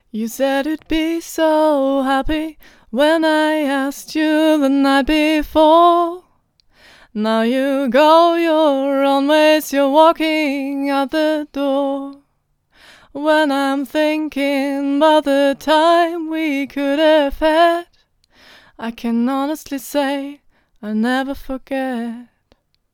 gesang f; beyerdynamic fox

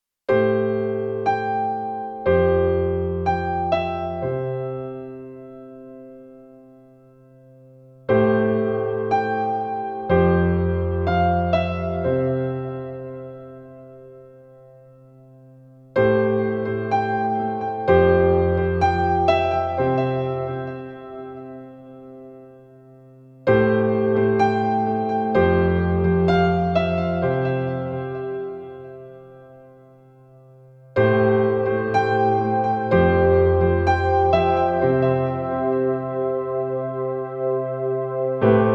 nord grand royal grand mit fx

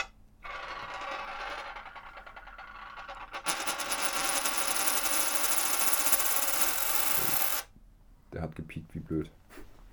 muenze; roland r-26 testbericht

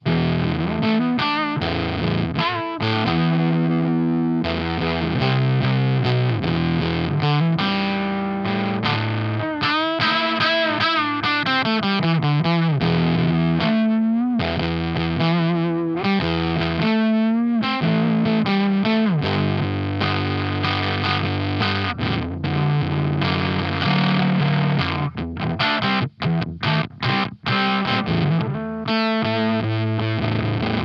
fuzz bass 9uhr drive 12 uhr dry 15 uhr

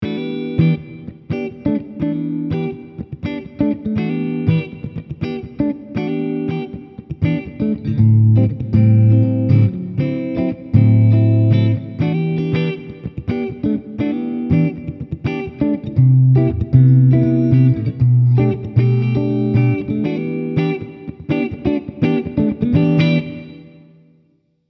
funk beide pus; ibanez artstar as153b

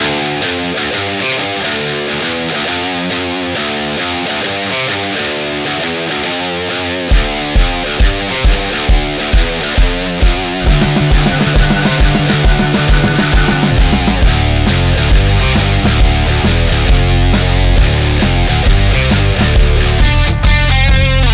gitarrensound im bandmix mid boost

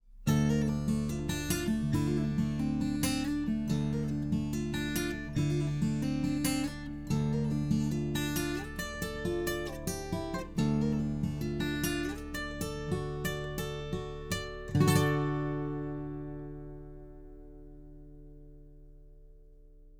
fame pro series sd-100 kugel picking; schallloch r

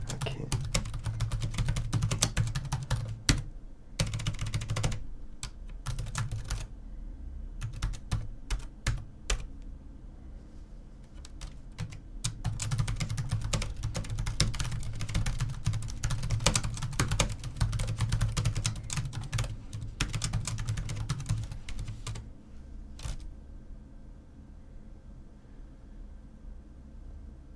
tastaturschreiben

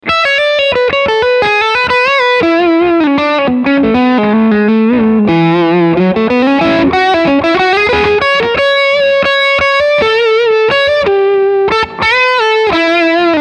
lag roxane racing 1500 steghumbucker overdrive

